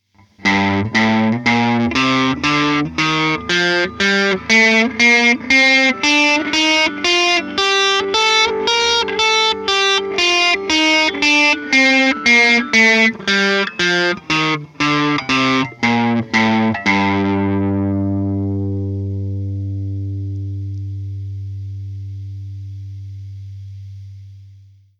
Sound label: G Minor, guitars; More Otto Mods